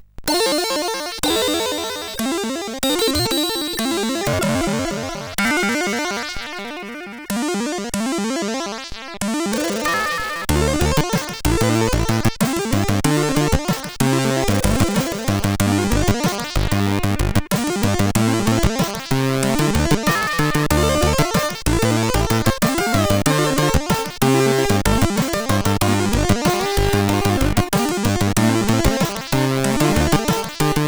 sid, CSG8580

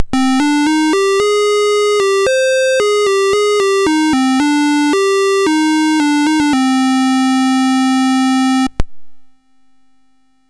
Dragonworld sid MOS6581R4

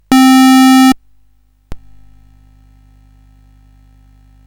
Dragonworld sid CSG8580R5

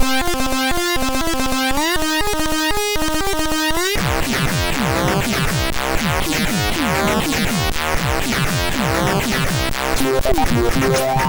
sid, MOS6581R3

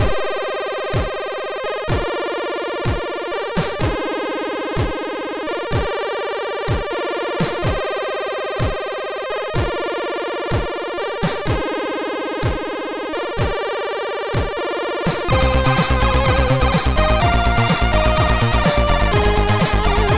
sid MOS6581R2; 64er-Disc Intro

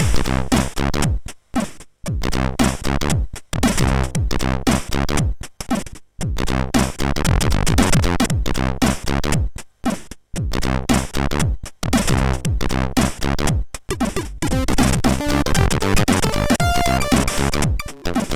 Short But Cool, sid MOS6581R3

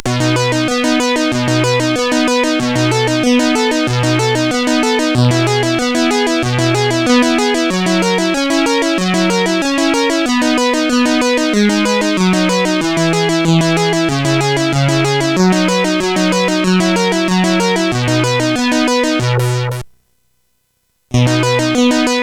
Instrumental Compositions, CSG8580R5

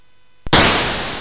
Space Gun

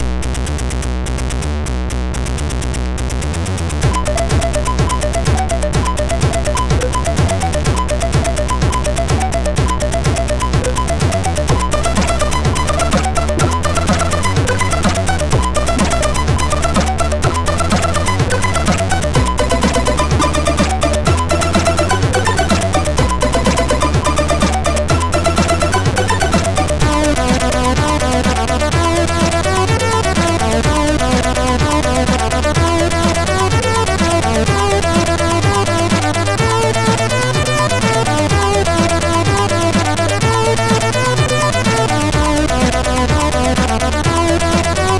Nice Introtune, MOS6581R2

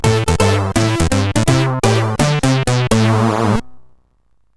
Jingles, CSG8580R5 sid

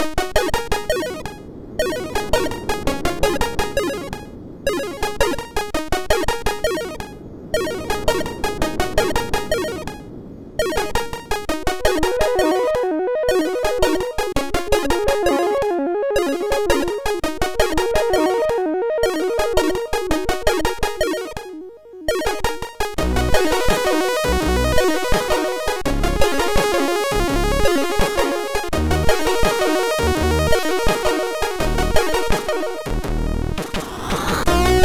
Last Truckstop 3 End Tune, MOS6581R3

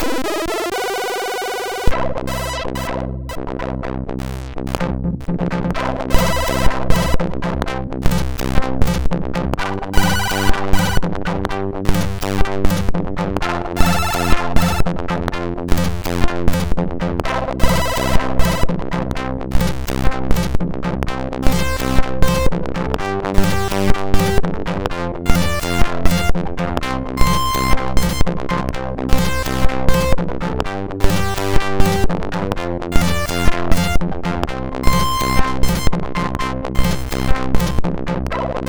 Rap

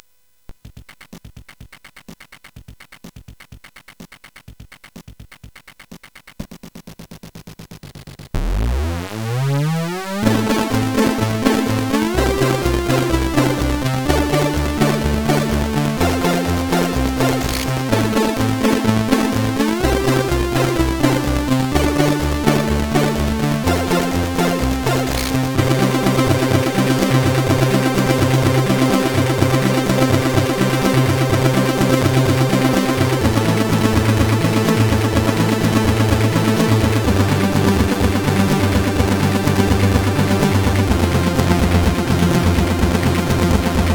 Beat-Box, CSG8580R5; sid

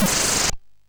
Shuffle, sid MOS6581R3

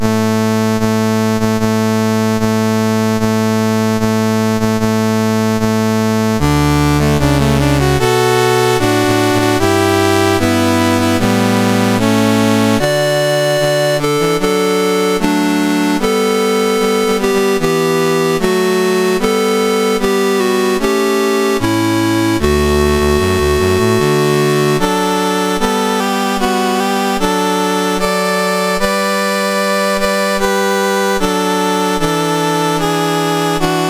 MOS6581R4, National Anthems; Grenada; Dave Marquis

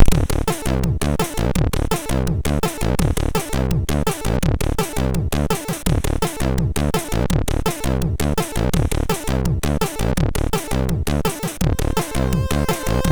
MOS6581R3, sid

